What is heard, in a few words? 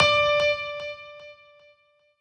piano